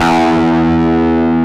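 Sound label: E1 distortion guitar; AKAI S-Series CD-ROM Sound Library VOL-8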